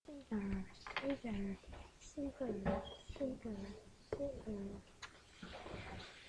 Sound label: seagull